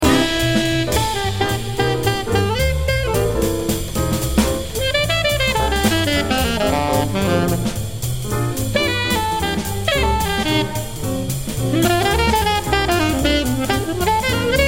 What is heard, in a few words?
solo